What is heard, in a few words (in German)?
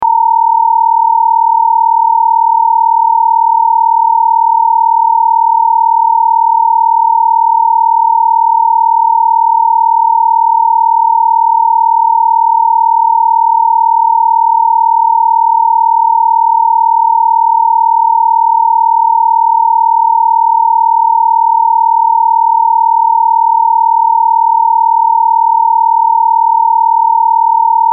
binaurale beats gamma waves 38 Hz